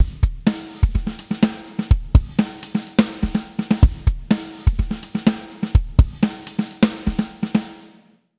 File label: Studio Ghost Note